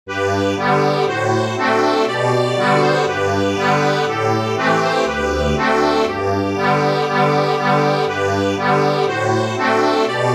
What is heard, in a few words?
accordion